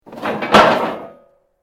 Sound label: metal rods move